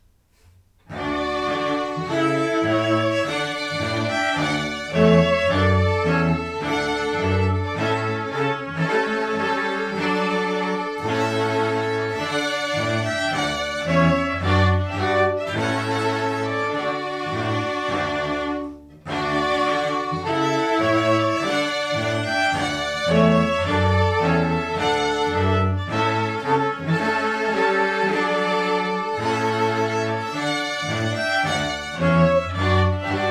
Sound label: trumpet voluntary